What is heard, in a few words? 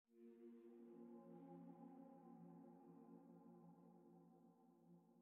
Jingles FLUTE STING; Semblance